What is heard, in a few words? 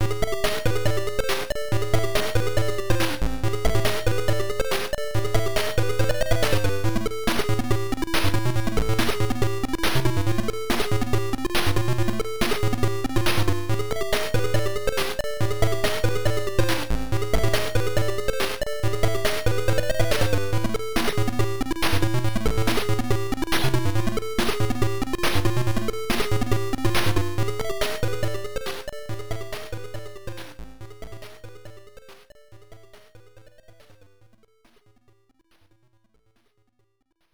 Metal Creature; hydefos hyper defending force system msx2 psg